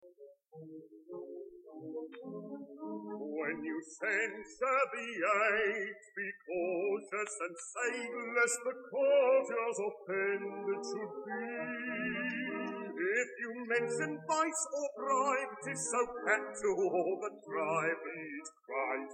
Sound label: air